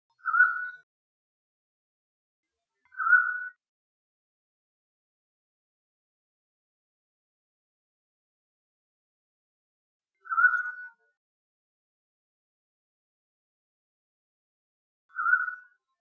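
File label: mountain quail; The Ultimate Birder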